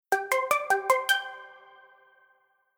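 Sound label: Future Bass Melody Pluck Lead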